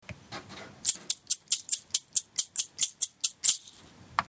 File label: gachapon maracas